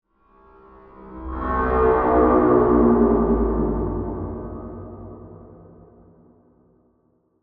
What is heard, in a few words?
Horror Chime Reverb; Musical Sound Design